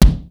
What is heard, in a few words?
KICK, Hip Hop